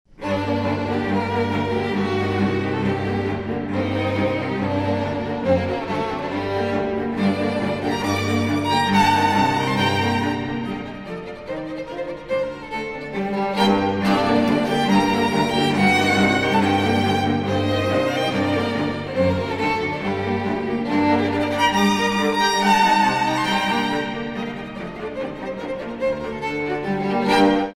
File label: hungarian dance no 5